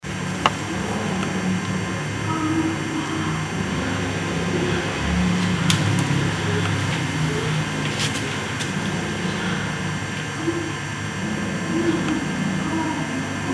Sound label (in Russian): zvuki seksa stony